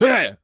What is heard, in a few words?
Worm Attack; Col Worm Attack 07